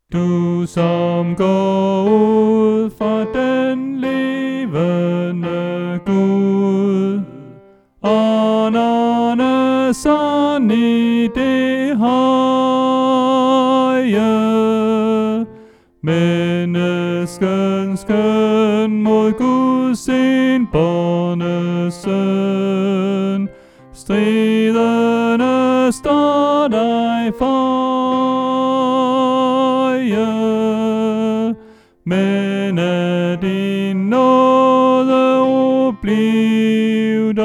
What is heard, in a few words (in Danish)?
Tenor